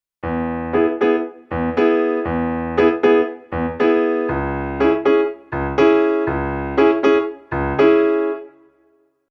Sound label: motown